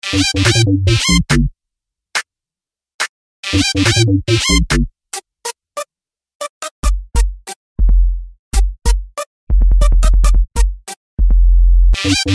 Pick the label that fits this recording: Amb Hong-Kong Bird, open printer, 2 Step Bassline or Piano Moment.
2 Step Bassline